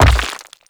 Brawler Rock Elemental Footstep Default; Warframe; Warframes

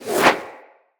Fire Arrow Whiz By; Level Events; Midrath; Warsong Prologue

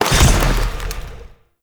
Ground Spike Burst; Enemies; Abilities; Warframe; Zariman; Void Angel

